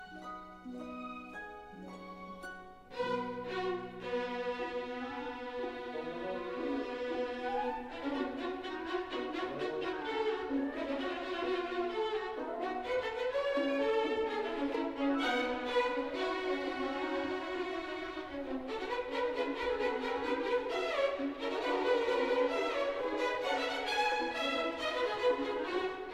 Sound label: Concerto for Orchestra 2nd Violin excertpt; Bartok; Schwarz